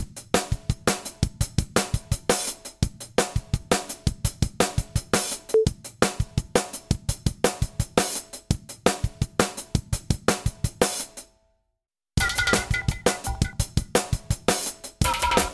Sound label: DRAWMER 1973 low GR 15dB